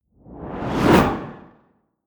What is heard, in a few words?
swoosh